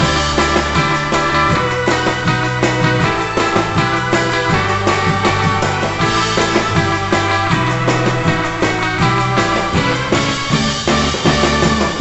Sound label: Drums Chorus; monster lab; nds; Music Spirit Drums A1 Chorus